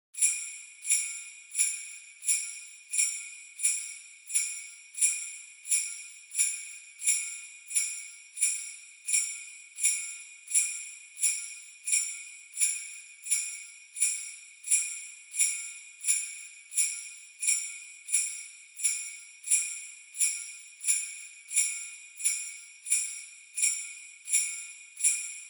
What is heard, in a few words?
Sleigh Bells Shake Gentle